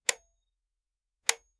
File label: electronics